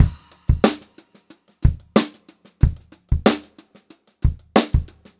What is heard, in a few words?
Funk-Groove Drumloops; USB Soundscan vol.38; AKAI; 90 s Sample CDs